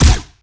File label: Lasrgun Guardian Pistol Fire